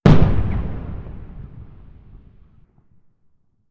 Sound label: bomb